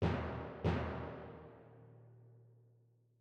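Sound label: CAVE Timpani Soft Hits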